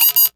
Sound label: Bleeps